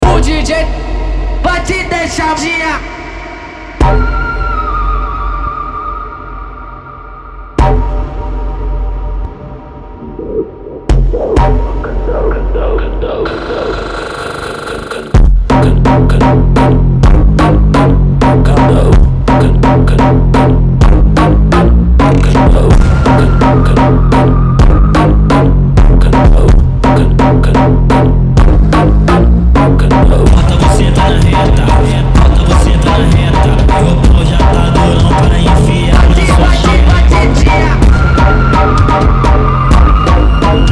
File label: Phonk Music; فانک phonk